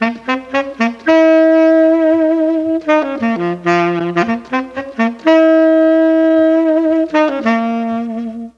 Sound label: F#3 SAX, Mellotron; Mike Pinder - Mellotron Archive CD; FOXTROT+SAX